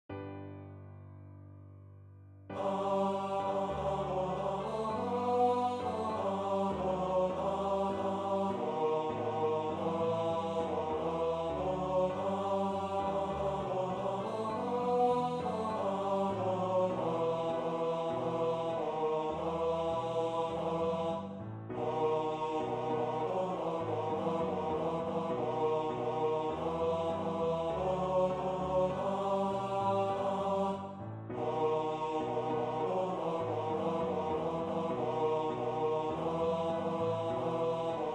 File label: Ukraine National Anthem Tenor 1; Second Tenors; Ukraine concert